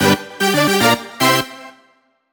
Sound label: Jingle Playball; Clubhouse Games: 51 Worldwide Classics